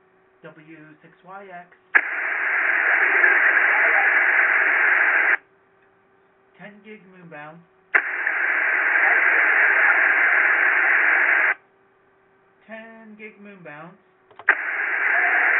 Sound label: 10ghz ssb cw echos; Contesting; EME